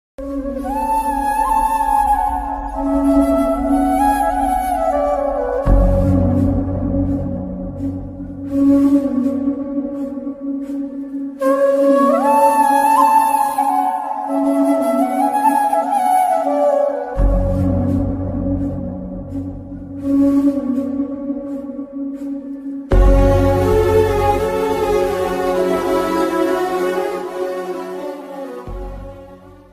ala vaikunthapurramuloo best emotional family sad bgm ringtone